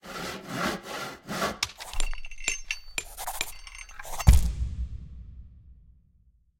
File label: crafting brushstyle